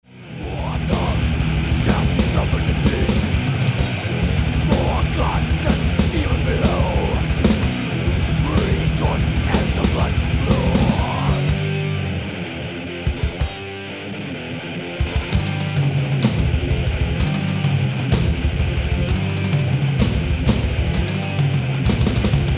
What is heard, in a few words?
drums